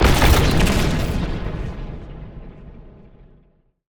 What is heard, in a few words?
explosion Reactor Neoplasm; explosions; Mindustry